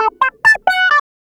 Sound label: Clavinet; Clavs; Ambient Grooves